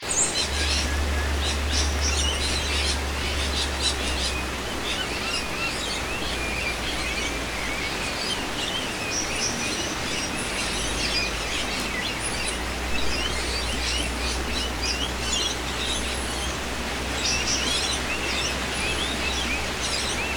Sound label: rainforest soundtrack